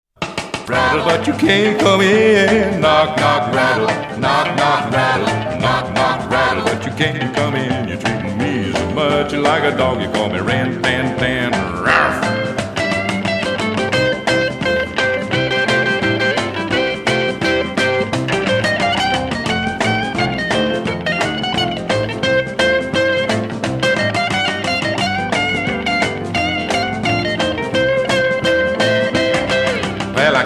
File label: knock knock rattle